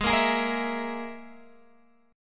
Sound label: Ab Suspended 2nd Sharp 5th Ab Guitar Open G